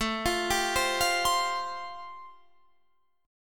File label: A-Minor 7th Sharp 5th, Guitar Standard, chord; 17,20,17,17,18,20; arpeggiated; capo=9